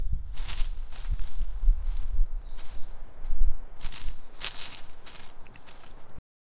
tiny footsteps crunching; agriculture

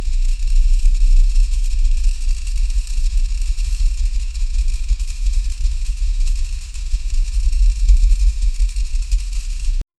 slide on ground that can, object manipulation

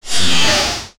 a loud cinematic and imme, sword

